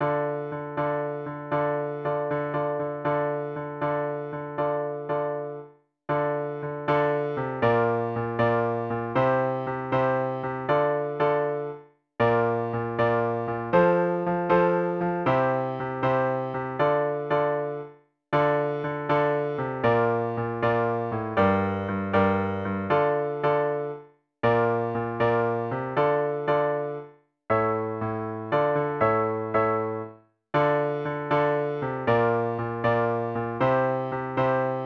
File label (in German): Klavier Kurz Ohne Sax; Klavierbegleitung